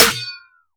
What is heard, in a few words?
Snare; TRUTH-HZ; Crowdsourced Sample Pack; Drum Kit; Claps & Snares; Whoo